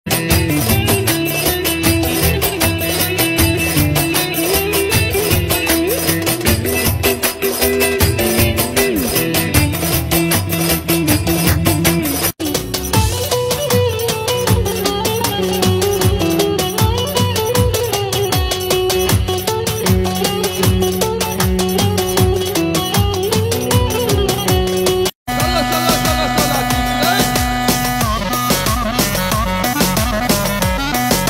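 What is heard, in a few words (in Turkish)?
çiftetelli karışık oyun havaları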